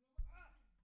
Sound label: BD In